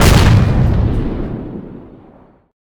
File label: 80mm cannon; Weapons; EK modern; Barotrauma